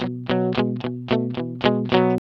ROCK B 110, Vintage Blues Guitar; USB Soundscan vol.22; AKAI; ROCK2

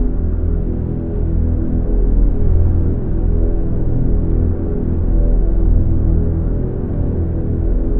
Drone Ambient; Drums & Drones

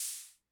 Cymbal, rhythm king; classic machines

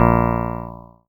A#, PIANO; Big Fish Audio; Synclav FM

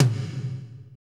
tom, Power Ballad; Northstar; Drumscapes Roland

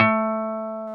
Jazz Guitar Emperor; Club-50 - Foundations

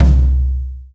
NATURAL P, DRY+FAT KIT

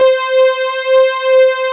SAW SYNS; Pro-Rec - Synthsonic

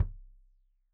Kik Stereo; Deeply Sampled Drums

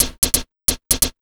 DRMSND, Underground Hip Hop, BEATKIT

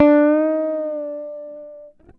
GTR TRIK, Jazzistic; Univers Sons

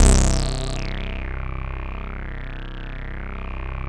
SYNTHACON LM 4, JV-1080

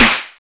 Techno Snare, Roland BK-5; Snares

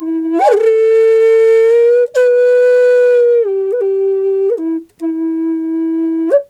E wild flute

